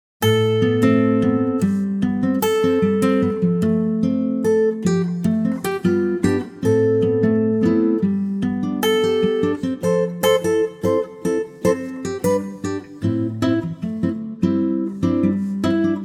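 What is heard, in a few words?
suddenly Am A acoustic guitar latin; key - Am - vocal range - D to G; Latin based intimate acoustic guitar vibes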